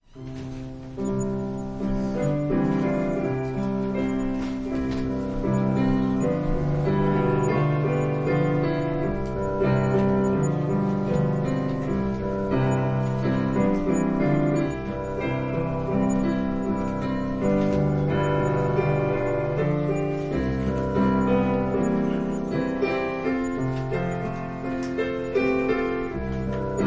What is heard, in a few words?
Piano prelude